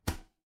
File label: impact slam